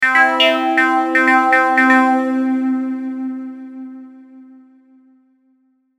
Logo Synth Filter; Sonic Logo; Blastwave

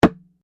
Bongo Low Slap, Small wodden bongo set; Drums (Sound Effects:Musical)

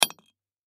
Impact Axe Miners Pick Into Rock; Pickaxe; Axes; Blastwave

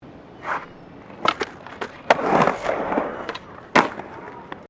Skateboard Half Pipe Through; Skateboard sounds; Skateboarding; Sports

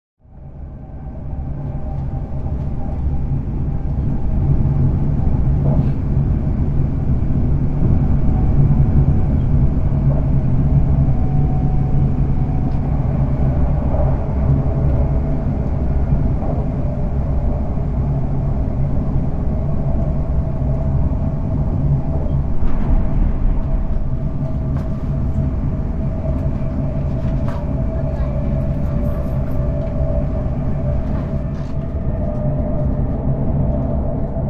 Ambiance on board a travelling train; Train Journey; Trains (Sound Effects:Vehicles)

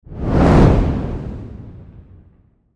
Fireball Burst, Fire Burst Flame Blast; Heavy; Flames; Sound Design; Flame Sound Effects

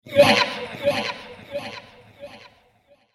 Nasty Baby Dragon; Monster Sound Effects; fantasy sound effect; Andrew Fleming; Twistedtracks

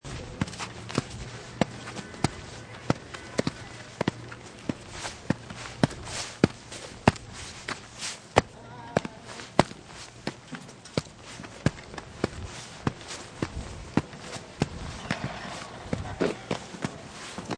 Ski Boots Walk, Ski boots on walkway; Skiing and Snowboarding; Sports

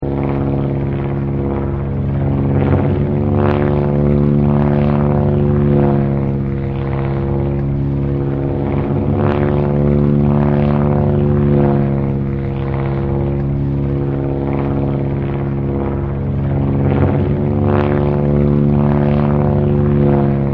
Fighter Plane Loop; Airplanes; Vehicles; Partners In Rhyme

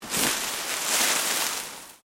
Leaves Move Medium

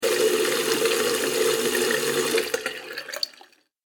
Water Filling Bathroom Sink; Andrew Fleming

